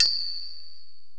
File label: Korg x5, Drums